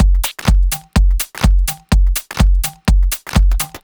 Shuffle House 125 bpm, drums; Loops; Ableton Live 12 Lite; Core Library

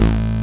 Infected Bass A1, Synths; Multisamples; Ableton Live 12 Lite; Core Library

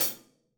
Hihat Closed Stick Shank

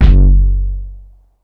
Kick Dirt; One Shots; Drums; Lange

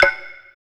Wood Block Combo

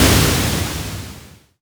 FX Noise Pink MS; FX Hit; Drums; Ableton Live 12 Lite; Core Library

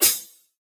Hihat Open Pedal

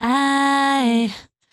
Vocal Tuned "I"; One Shots; Ableton Live 12 Lite; Core Library

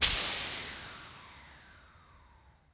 collision color; Audiosurf; cyclone

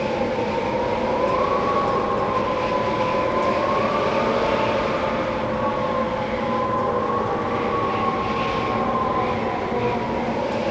Mountain Window Wind Tunnel Sm Loop Stereo; Journey

